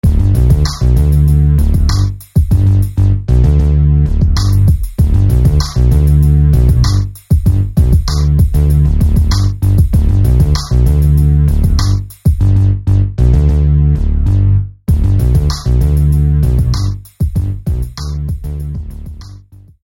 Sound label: Basses Loaded; SFB FX